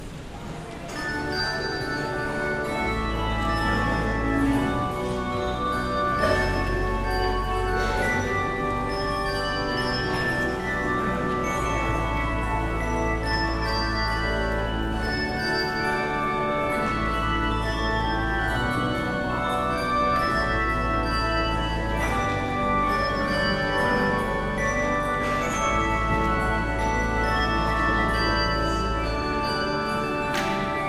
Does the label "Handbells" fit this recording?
Yes